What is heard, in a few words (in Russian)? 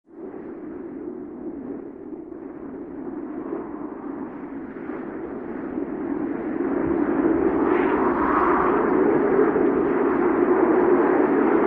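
вой урагана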